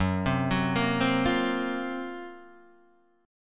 F sharp Major 7th Flat 5th, Guitar, Half Step Down